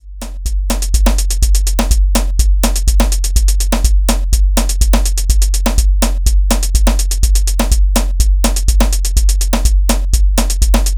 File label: Afro House